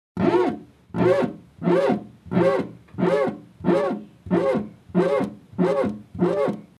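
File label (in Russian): звук трения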